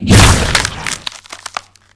tackled, hunter hit

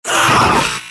scout pain sharp; tf; mvm

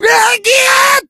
rage at victim, infected, male

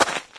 gravel, footsteps

